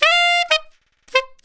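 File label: West Coast Hip Hop Sax Eb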